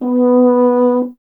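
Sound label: brass French horn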